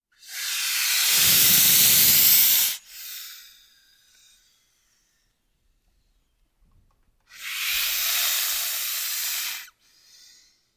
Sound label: Boa, Albino Hisses, Multiple. Close Perspective.